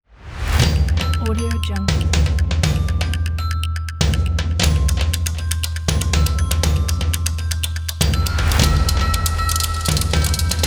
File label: upbeat christmas holiday percussion; zigro